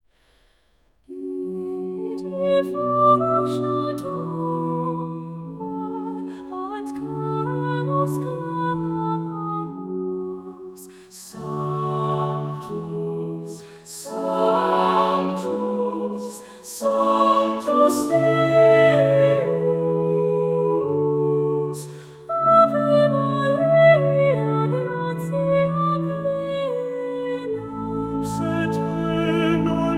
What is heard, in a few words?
Devotion Female, gregorian chants